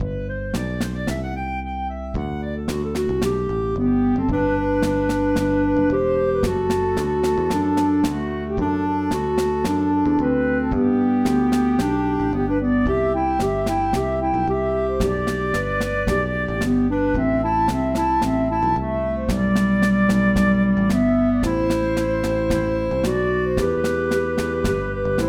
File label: Peru Processional; MTWFC VOL1 Audio Accompaniments